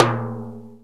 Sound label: Timbale Low